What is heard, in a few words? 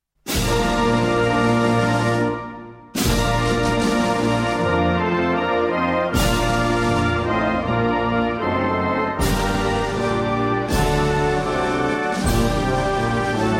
anthem